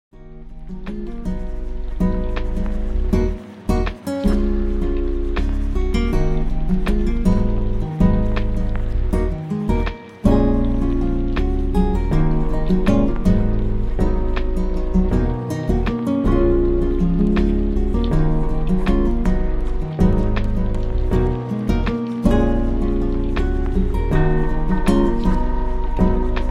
MUSIC DUO JAZZ

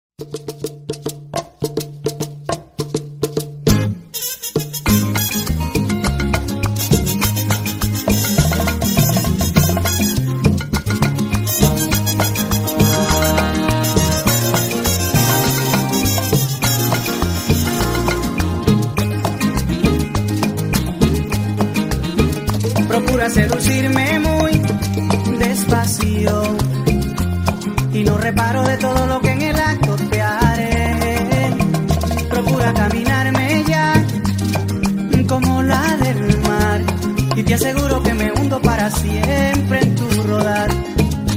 MUSIC SOLO SALSA

